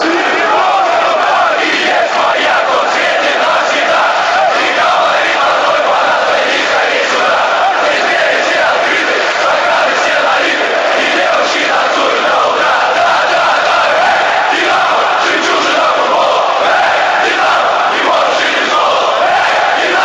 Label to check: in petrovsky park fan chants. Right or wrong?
Right